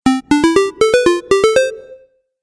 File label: Retro Game Melody; Video Game Sound Effects